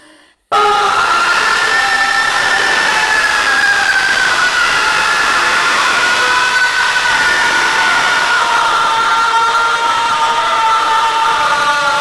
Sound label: a soul crushing scream, agony